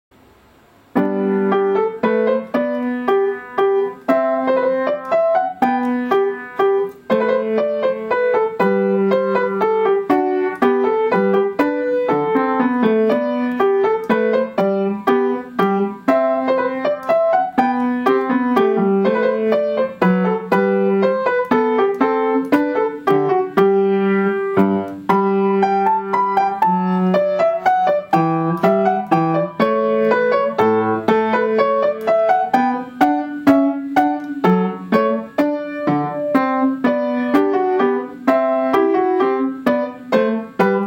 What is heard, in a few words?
minuet